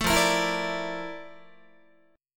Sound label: F Major 13th Guitar; strummed